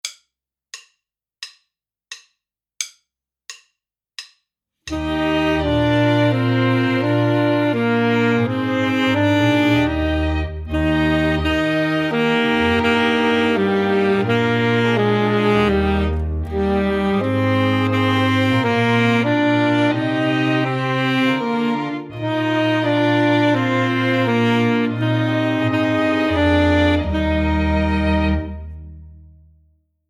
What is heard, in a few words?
Chorale Melody Full Sax